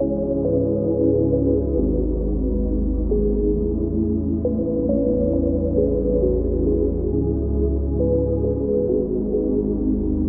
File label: Melody Cm 135